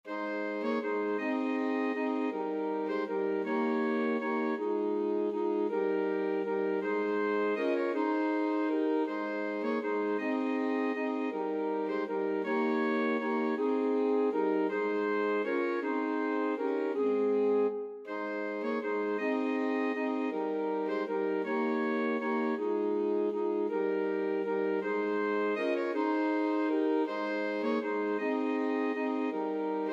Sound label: alto sax quartet